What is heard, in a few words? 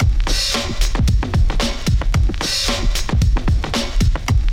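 drum loop; X-Static Goldmine 3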